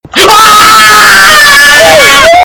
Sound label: Scream; gmod; darkrp